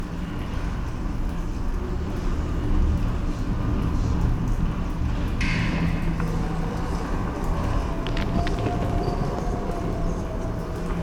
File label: Ambient; dante s inferno; x360